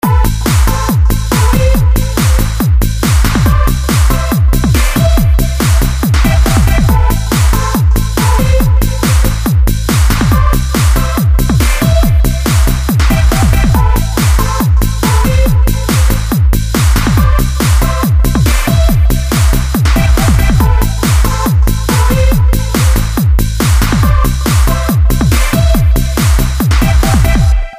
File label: OCTO VTI RIFF